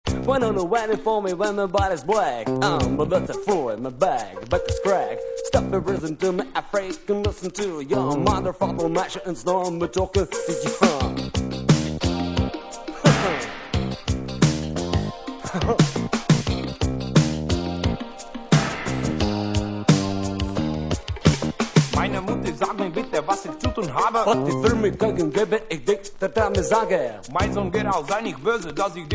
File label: RAP HIP HOP HOUSE MUSIC intro